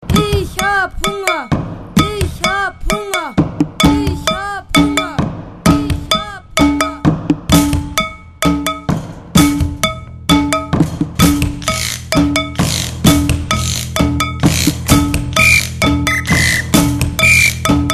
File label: Stomp